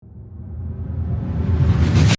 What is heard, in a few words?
Riser Flange Chop; Riser, Suction, Reverse, Production Element, Imaging Element, Accent, Transition; Spacecraft Sound Effects; BlastWave